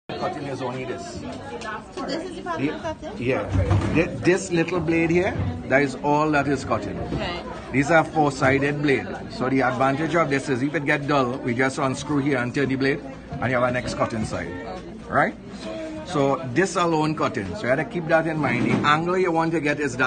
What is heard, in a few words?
Power tool Hoof trimming; 4 sided Blade uses